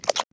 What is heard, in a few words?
mud